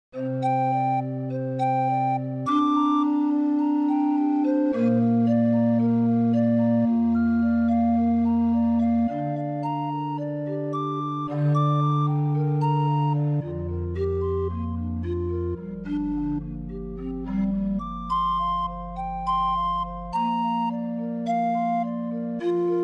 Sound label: forest pan flutes; fractal music; six eight